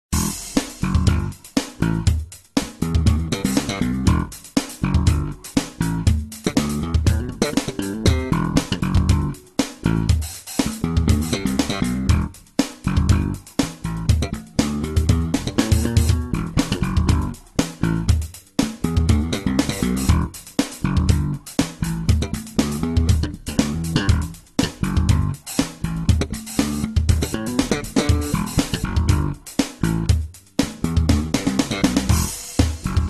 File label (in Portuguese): baixo